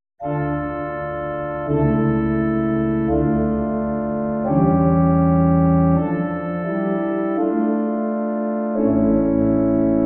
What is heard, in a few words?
cadences; Bach, J.S., Chorale 47 Vater unser im Himmelreich, mm.2-4